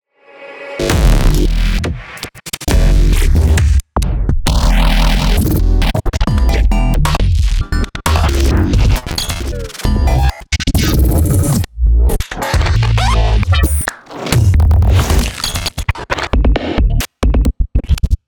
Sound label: BASS PACK; neuro; BIG5AUDIO